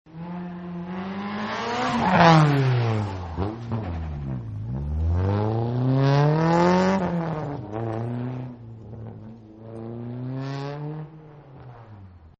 Rally Sport Does The Track, car; Cars; Vehicles; Partners In Rhyme

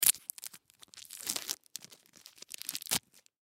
Candy Unwrap Wrap; Wrapper Sucker Food; Household; foley; Blastwave

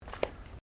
FOOTSTEPS THONGS (FLIPFLOPS) CONCRETE STEP

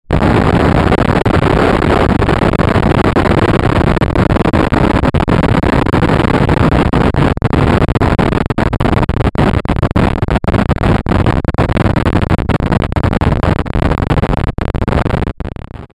Bed Short Harsh Distortion Break Up; Bed Sound Effects; Blastwave; Production Element Imaging Element Accent Transition

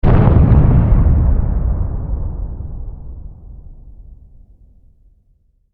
Science Fiction Explosion Distant Blast; Battle Sound Effects; Battle (Sound Effects:Science Fiction); SFX Bible 6

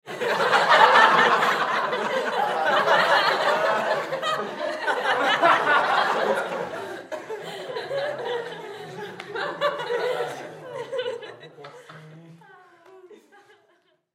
Human Crowd Studio; Crowd Reactions Laughter Loud Fade Out; Blastwave

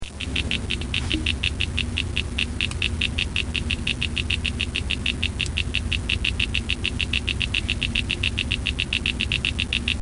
Radar Detector

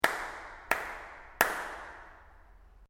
Clap Triple Ambient Reverb, hand sounds; Applause Sound Effects

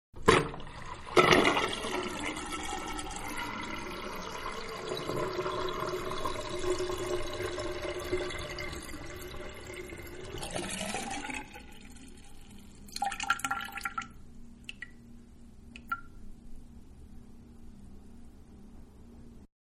Sink Drain; Bathroom Sink-Draining; Household

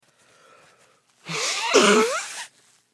Human Male Nose Blow Tissue Movement; Sick Sound Effects; Sick (Sound Effects:Human)

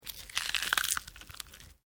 LIVE PLIABLE TEAR, Organic Foley sounds; Injuries (Sound Effects:Combat)

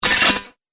Droid Electro Move, robot; Robot Sound Effects

